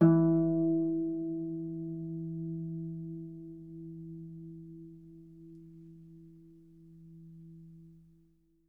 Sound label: Harp E3; Miroslav Vitous - Percussion & Harp